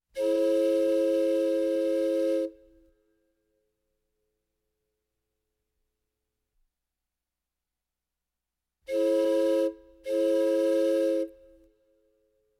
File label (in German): Marine Bootspfeife Signal